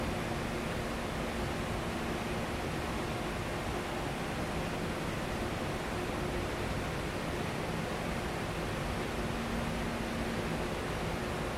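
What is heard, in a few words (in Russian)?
внезапный громкий буйный шум работы производства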